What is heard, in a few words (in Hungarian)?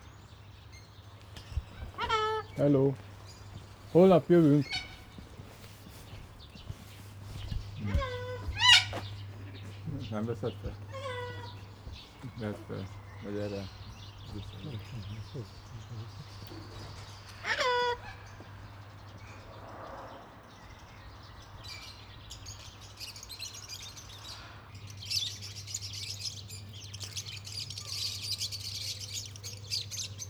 sárgabóbitás kakadu; nyiregyhazi zoo 2010